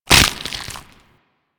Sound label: Arm Break; Warframe; Finishers; Tenno Alt Hip Throw